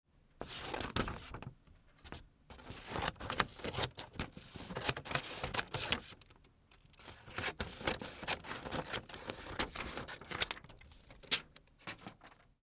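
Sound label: cutting paper with scissors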